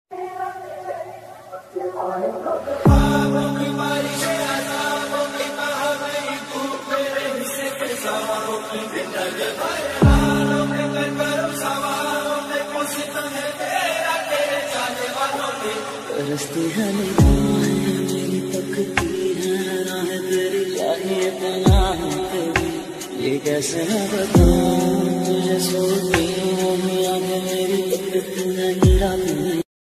romantic ringtone hindi song ringtone phone ringtone love ringtone